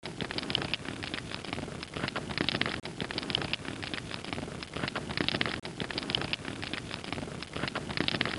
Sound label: fire; Ancient Modular Tombs